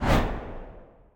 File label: intro panel whoosh; Ben 10 - Omnicode